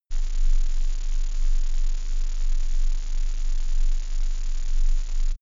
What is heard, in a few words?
space alien machinery steady calm